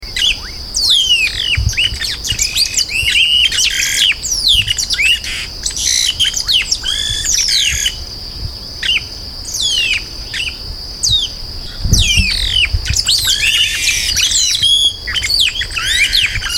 Chupim-do-Brejo

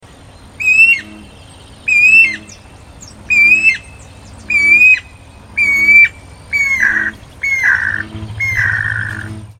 Anu-branco

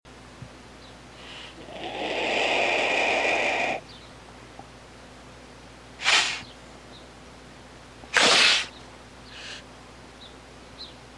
Urubu

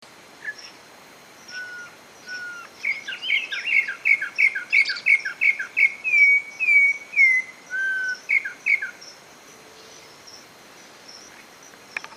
Corrupião

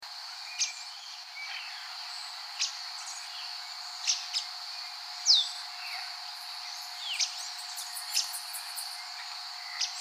Andorinha

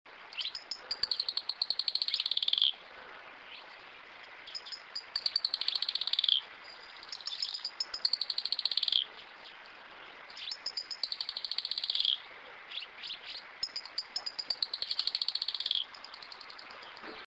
Tesourinha

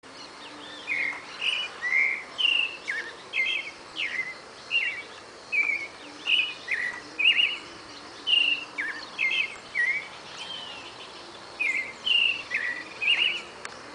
Sabiá-poca

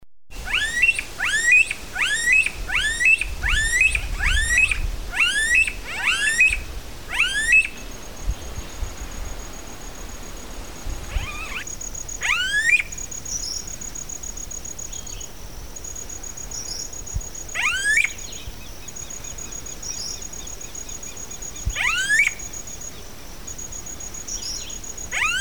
Anu-Preto

